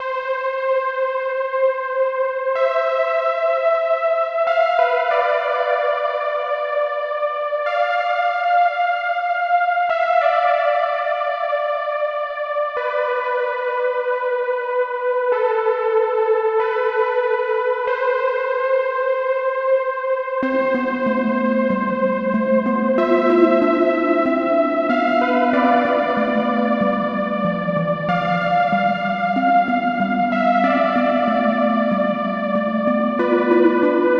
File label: Dungeon Synth Practice Piece; Just a Dungeon Synth Practice Piece